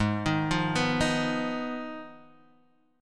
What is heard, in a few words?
G sharp Minor 6th G sharp down